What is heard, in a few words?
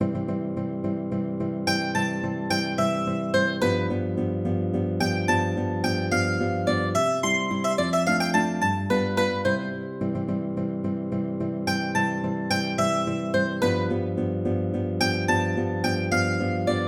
mandolin guitar duet